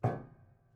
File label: Prepared Piano